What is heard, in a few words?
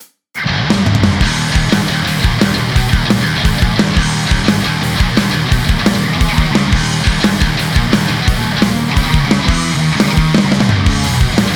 Drums; Free Drums Shootout; ML Drums